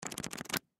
Paper Pamphlet, Paper Pamphlet Flip Through Pages Fast; Materials; Foley; Blastwave